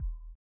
SONAR L; Spectrasonics; Metamorphosis

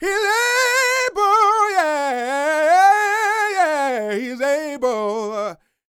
Vocal Gospel; Vocal Planet Vol1 Gospel

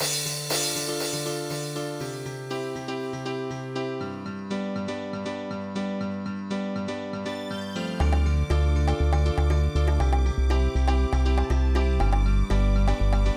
Ital Theme 120 bpm, Old Skool House; Big Fish Audio; OSH Construction Kits

